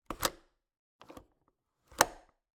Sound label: Cabin, window, latch, flip, lock, open close, wide; Eiravaein Works; Vaeyan IV